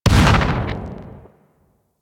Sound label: Shotgun Alt Proj Mini Explosion; Weapons; Revenant; Warframe; Tenno